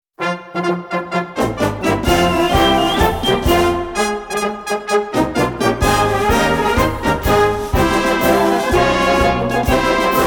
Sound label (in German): Blasmusik, Der Solotrommlermarsch